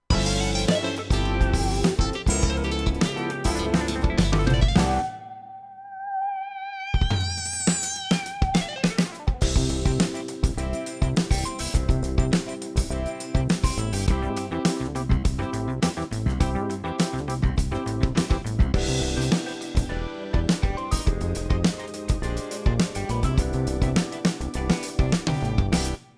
yamaha tyros 5 styles rb pop diva

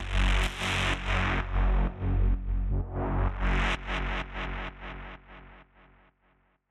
TRANCER WET

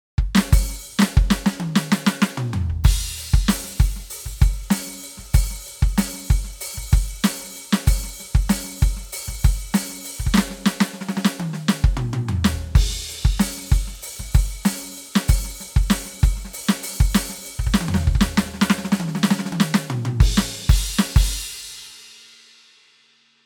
acoustic, E-Drum Set; Roland TD-17K-L

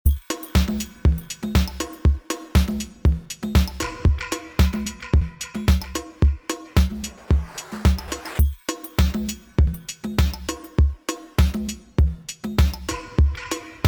bpm 120 70; accusonus rhythmiq

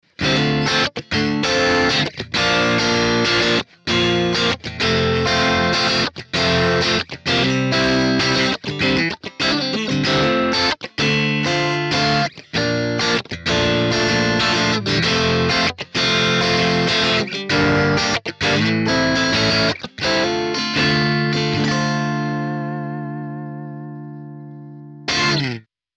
vox valvenergy mystic edge

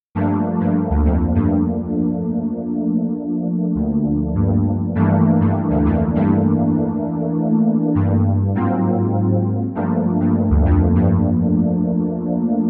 nord lead a1 soft brass

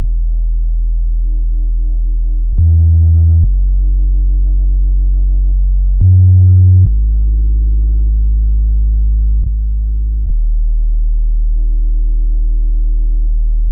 Bpm70 E SubBass; Not The Same; Prime Loops Urban Dreamz

